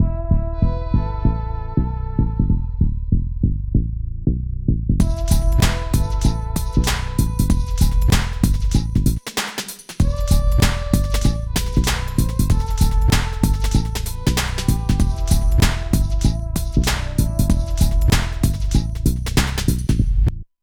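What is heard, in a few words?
LOOP, left; East West - Phat & Phunky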